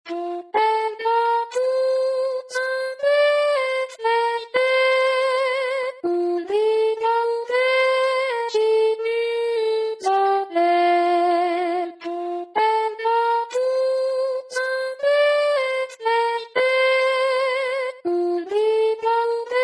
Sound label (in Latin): puer natus Soprani